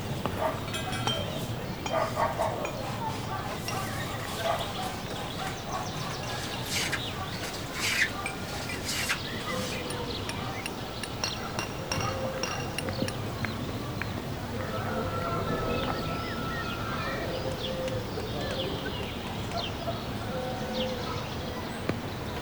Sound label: Town Ambient; 7 Mages